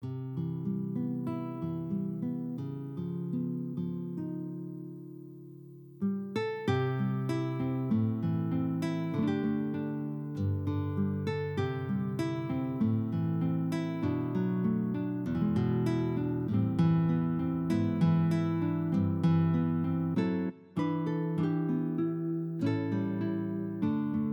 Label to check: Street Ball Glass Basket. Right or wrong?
Wrong